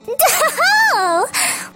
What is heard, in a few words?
giggle